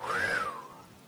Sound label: whew